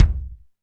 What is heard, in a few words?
DM5 Kick, Alesis DM5 drum machine